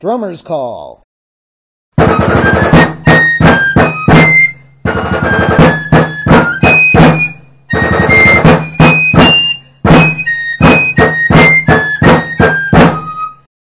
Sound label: Drummers call